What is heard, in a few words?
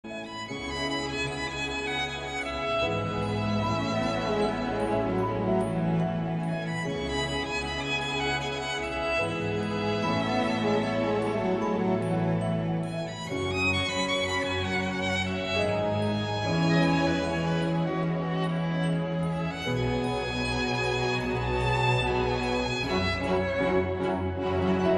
Orchestra Instrumental Version; World of Tres